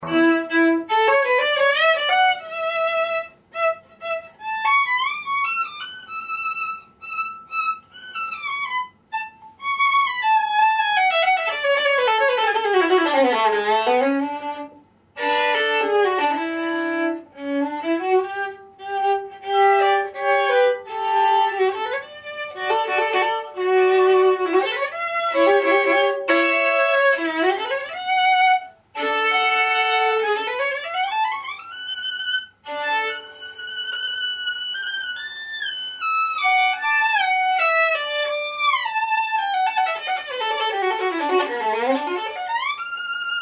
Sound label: Cadenza